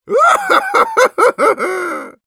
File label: laugh happy, ru; Счастливый смех 3; Medic